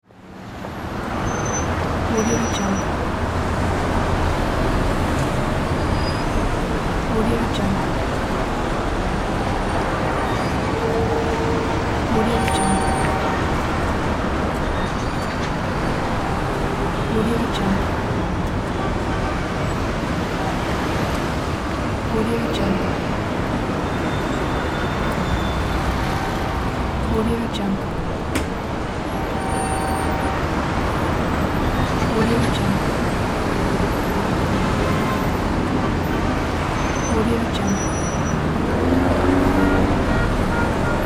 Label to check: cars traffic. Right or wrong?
Right